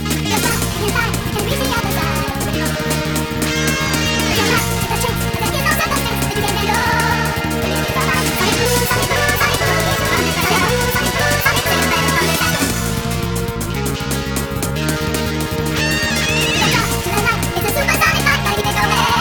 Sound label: RINGTONE